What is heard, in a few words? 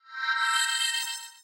Vertical Bar Fill